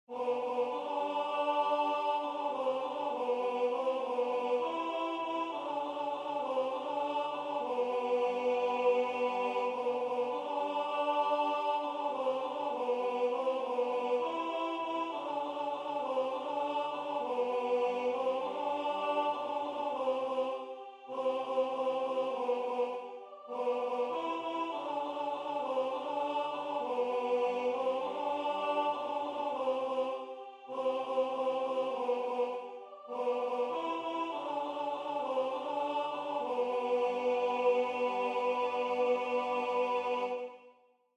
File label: Siyahamba African Song Tenor; Concert « Amour et Traditions »